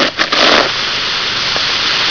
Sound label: sizzle